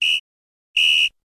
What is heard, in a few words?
Referees whistle half time